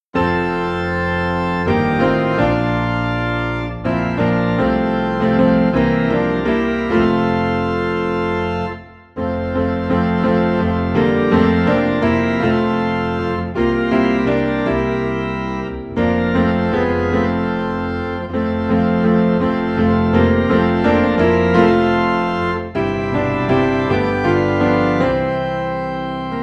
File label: Piano and Organ Low in F; Jesus Is Calling